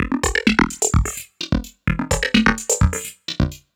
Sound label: VFX2 Loop 128 BPM